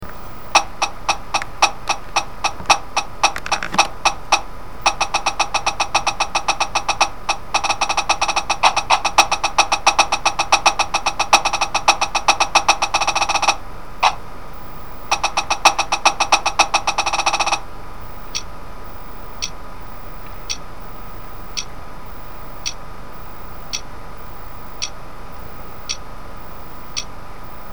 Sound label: snare, drumline; blue screen of death